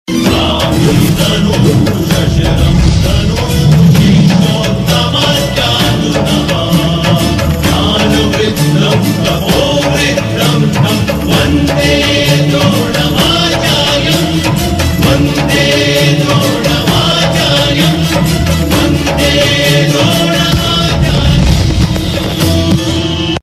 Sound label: Theme Song; Drona; TV Serial Tone; Sony; Suryaputra Karn